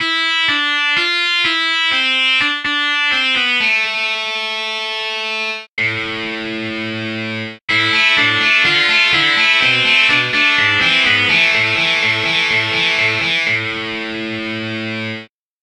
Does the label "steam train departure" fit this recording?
No